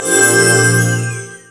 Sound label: SPIRIT POWER; spirit handler; SH HYUN ROK